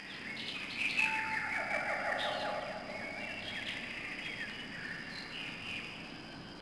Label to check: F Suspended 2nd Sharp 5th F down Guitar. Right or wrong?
Wrong